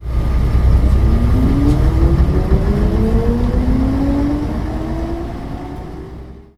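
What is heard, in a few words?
aec regent 3, bus; Simutrans; Pak128.Britain-Ex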